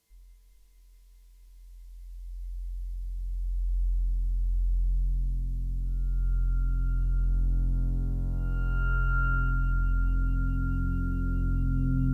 Synth Bass

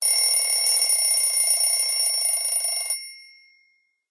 Alarm Clock; Farm Mania 2

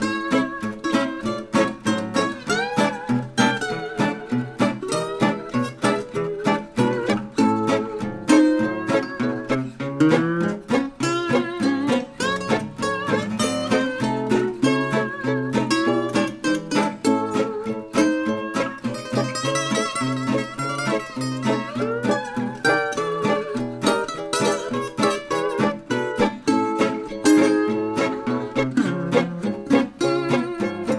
menu blues loop; SpongeBob Patty Pursuit

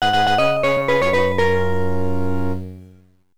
Opponent Wins; mahjong gakuen arcade gamerip 1988

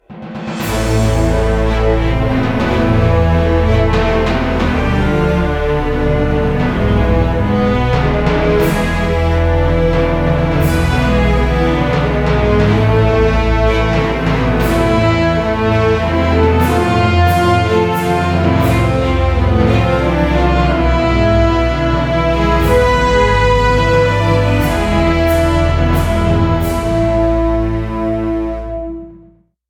Non Fighting Scenes

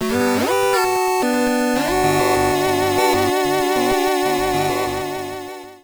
item unlock epic sound; appraiser

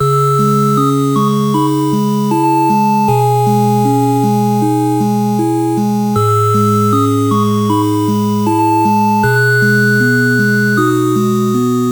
Gameover; Joker Sounds

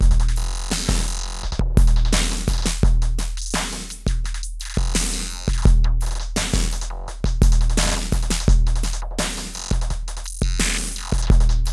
Trouble perc; jettomero hero of the universe

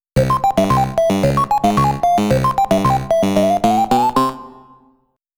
Start Music; Pac-Man Championship Edition

